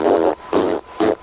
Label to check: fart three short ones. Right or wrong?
Right